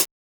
DnB Hat; drumnbass 170bpm; Drum Kits; musicradar; Drum Hits A

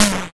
Snare, YM, CHIPSHOP HITS DRUMS; ChipShop Deluxe

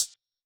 Tech Drum Hit, techno 120bpm

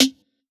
Tech Drum Hit, techno 120bpm

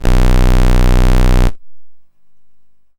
YM Fart; SFX; ChipShop Deluxe; ChipShop Hits

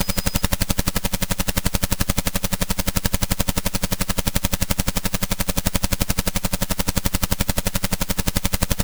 YM Helicop; SFX; ChipShop Deluxe; ChipShop Hits

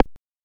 Perc, techno drum

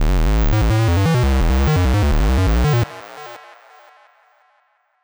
Synth Noise 85, hiphop 85bpm; musicradar

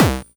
GB Kick, DRUMS; KICKS; ChipShop Deluxe; ChipShop Hits

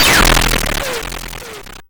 Dirty Bomb; SFX; YM; ChipShop Deluxe; ChipShop Hits

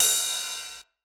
DnB Drum Hit Ride; drumnbass 170bpm; Drum Kits; musicradar; Drum Hits A

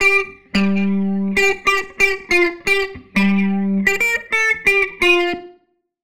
Guitar line, 90bpm, hiphop; musicradar

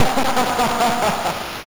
YM Vox Laugh; ChipShop; CHIPSHOP DELUXE; CHIPSHOP HITS

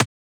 Snare, techno drum

